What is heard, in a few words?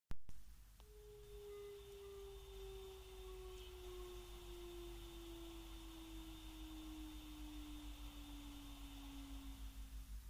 lin chirp down